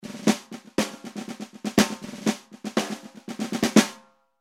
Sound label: ringy; dunnett